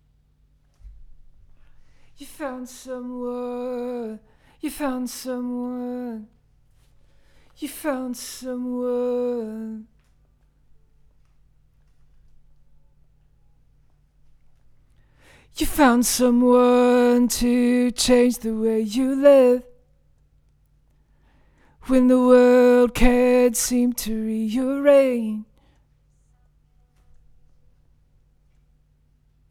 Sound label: Vocal